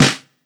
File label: snare sample c sharp key; hits one shots